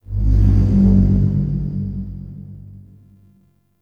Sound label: Metal Rumbles; Warframe; The New War; Zariman